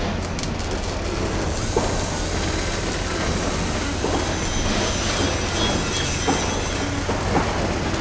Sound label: Build Building; ImpGal2; colony